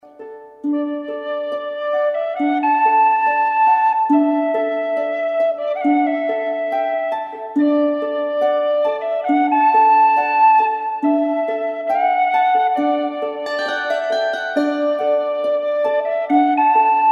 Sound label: Celtic Music